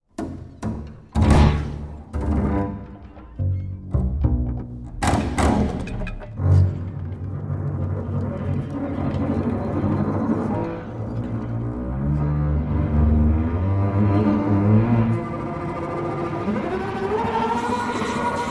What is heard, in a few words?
bass computer